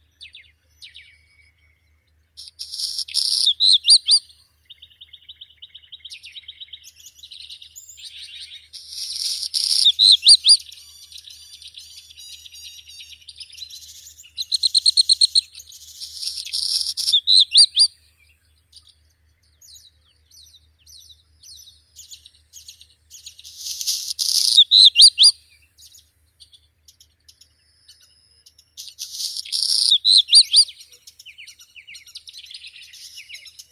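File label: black redstart, phoenicurus ochruros; gorihvostka chernushka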